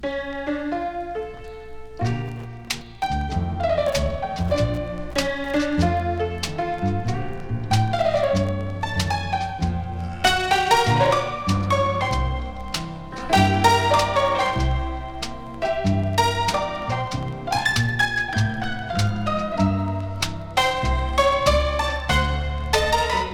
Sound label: barrelhouse honky tonk piano stereo